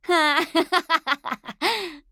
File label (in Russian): смех; Сомбра